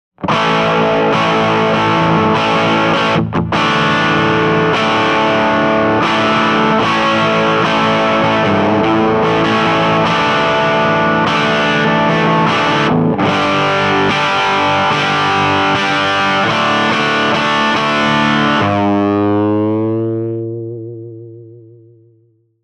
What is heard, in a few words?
Fender Tele Rusted Fuzz; EQD